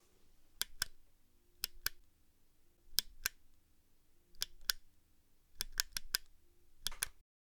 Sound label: Flashlight Torch Clicking Various